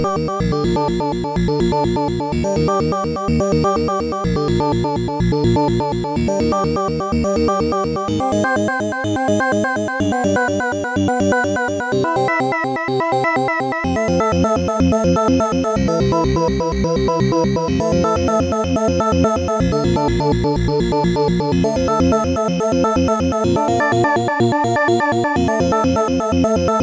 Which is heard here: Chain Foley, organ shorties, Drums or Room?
organ shorties